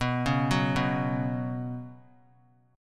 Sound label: B Suspended 2nd, chord; Bsus2 arpeggiated; 23,20,21,21; 3C,3F,3A,3D; JGuitar